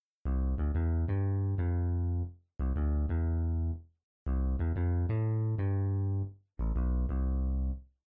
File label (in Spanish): Bajo Real